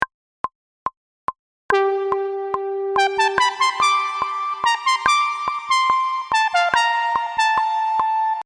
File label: Lead